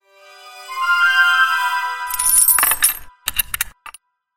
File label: new tip; Dlive Kazteel; stonefire